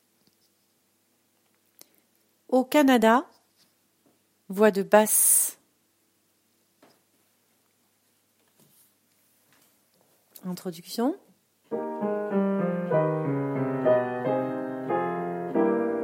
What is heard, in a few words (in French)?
hymne canadien basses